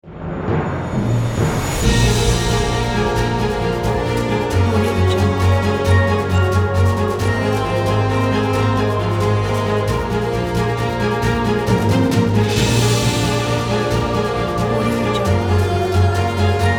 solemn orchestra presentation

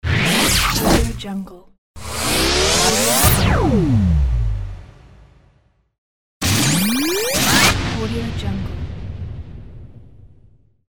futuristic glitch transition

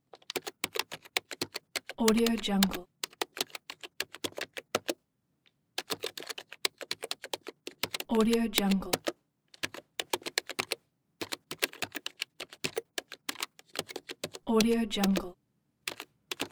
keyboard typing loop

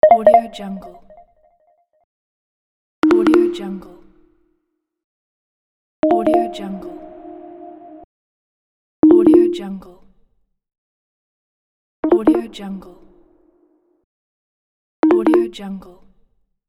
infographic notification

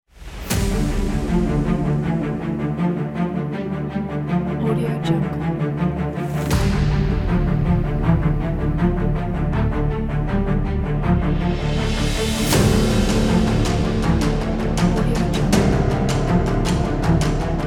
epic action trailer

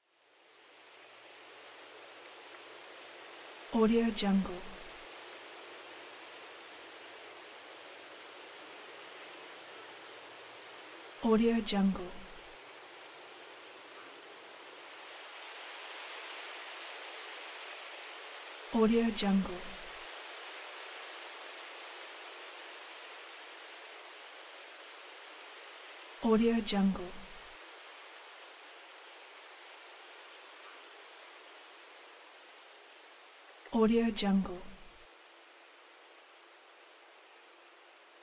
breeze

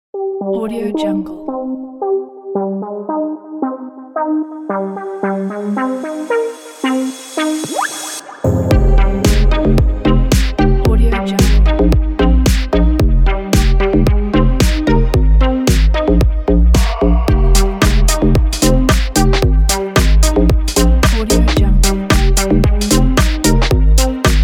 fashion vibe; mark-music

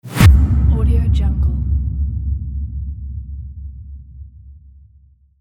transition impact

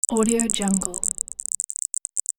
static electricity sound

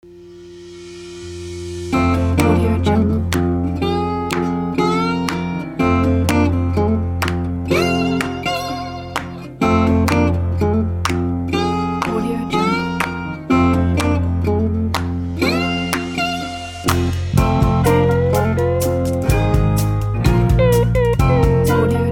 cowboy country blues

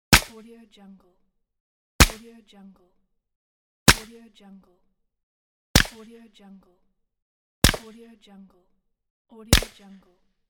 bushmaster acr combat rifle shots

